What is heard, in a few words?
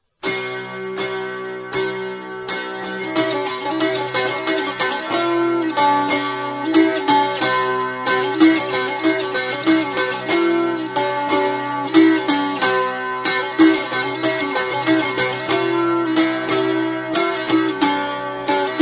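Polska, dulcimers & guitar; Polska efter Verde Crik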